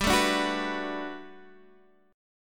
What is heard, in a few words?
B Minor 7th G, chord; Bm7/G; strummed; JGuitar